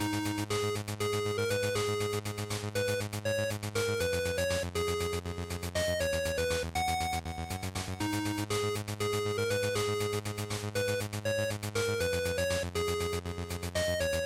Shooter, Stage, Loop

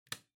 Light Switch Rocker, Dry Gentle

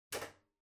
Ceiling Light (Far Pull)

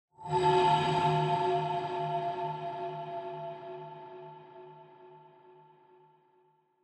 Horror Accent, High Long

